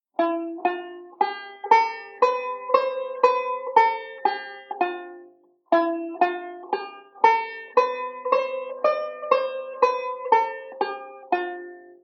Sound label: E Phrygian; Beyond Chord Melody Volume II